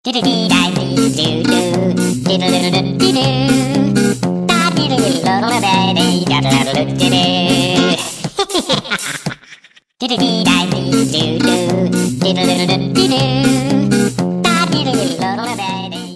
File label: funny song